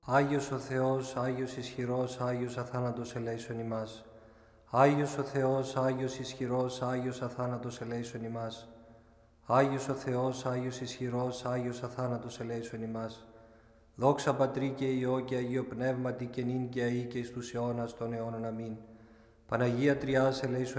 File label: Trisagion; Paraklitikos Kanon Panaghias Tis Paramithias